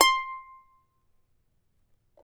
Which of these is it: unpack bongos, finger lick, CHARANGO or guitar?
CHARANGO